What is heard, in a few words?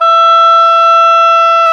OBOE, Orchestral Winds, Oboe mf f